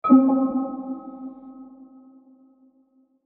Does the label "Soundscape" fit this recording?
No